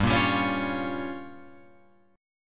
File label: G Minor 7th Flat 5th, Guitar Open F; Gm7b5; strummed